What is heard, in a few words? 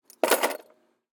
ATV Stereo Accessories Compartment Foley Various, ATV ARCTIC CAT 650 H1; DavidDumais